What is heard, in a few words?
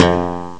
synbass; Amiga Soundtracker Sample Packs